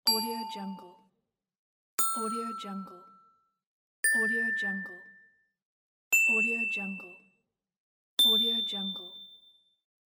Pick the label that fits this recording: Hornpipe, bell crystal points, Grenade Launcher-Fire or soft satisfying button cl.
bell crystal points